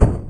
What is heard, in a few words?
flesh step, doom3 pinky